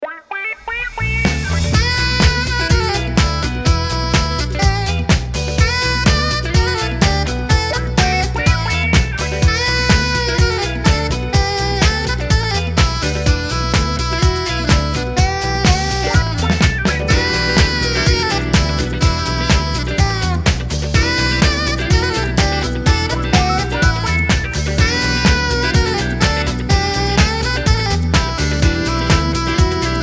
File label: Lounge